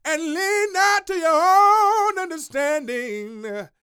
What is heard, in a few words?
Vocal Gospel; Vocal Planet Vol1 Gospel